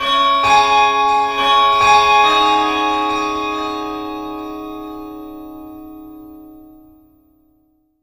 Pivot chime; Splatoon

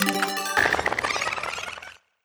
Menu unlock

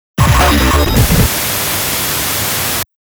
Winning Display Noise; Capcom vs. SNK 2 Millionaire Fighting 2001 Original Soundtrack

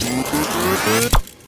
UI Fill Profit Boost Meter; Taps to Riches; Android

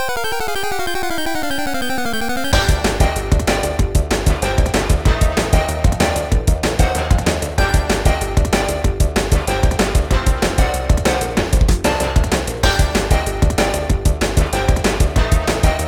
Instrumental; Rivals; Friday Night Funkin' Saturday Night Showdown OST mod Windows gamerip 2022

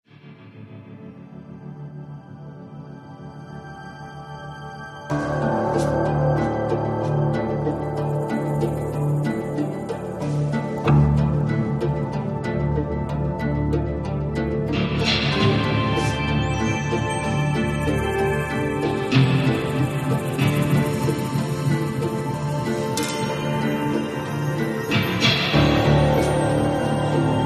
Loop Ambient; tales from the borderlands gamerip 2015

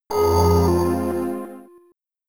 Antarctica Jingle; Ultimate Pinball Quest; Amiga

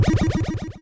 speed Jump; Dino Run

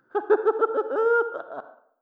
hahaha

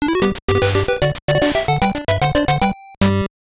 Floor Start; Adventures of Lolo 2; NES

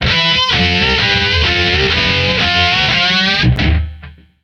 Guitar Shred

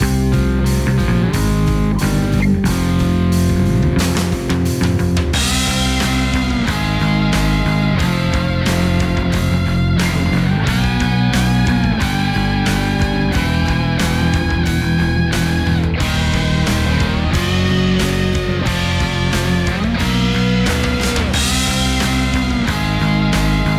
Opponent Goal; mario strikers football battle league; Toad

